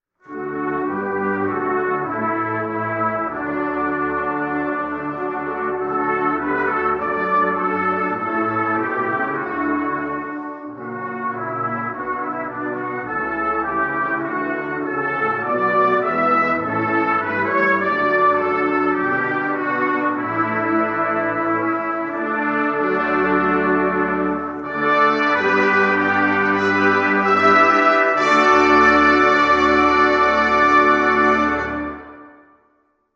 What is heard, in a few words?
Anthem Brass; Jersey